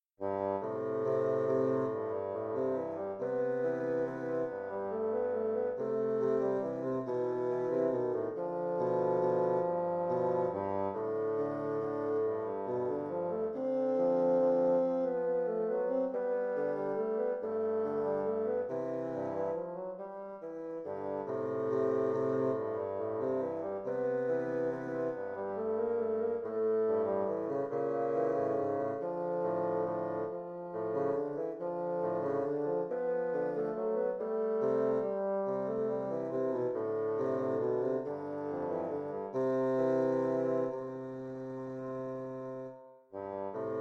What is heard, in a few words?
bsn trio